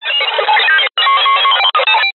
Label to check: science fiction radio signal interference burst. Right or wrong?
Right